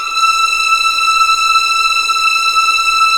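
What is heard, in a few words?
E6 legato F L, string; East West; The Ultimate String Collection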